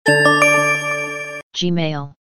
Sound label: gmail